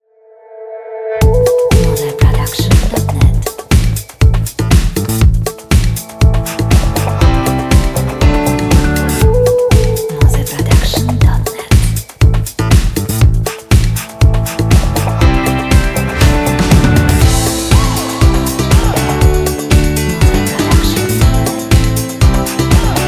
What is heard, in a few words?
Upbeat and Uplifting Pop Funk